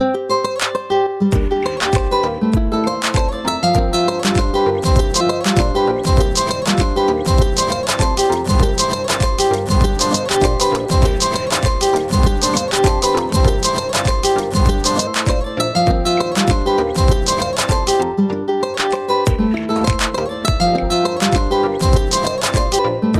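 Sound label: Cool Violin Samsung Ringtone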